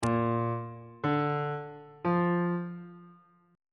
b flat mixolydian chord 1 is b flat major on bass clef